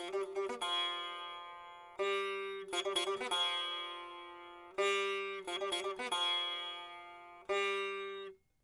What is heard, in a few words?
sitar